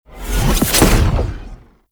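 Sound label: Teleport End; Enemies; Abilities; Warframe; Tau; Dax Ninja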